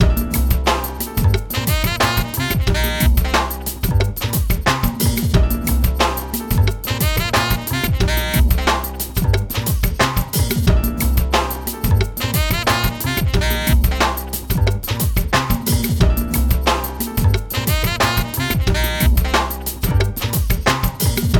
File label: loop relax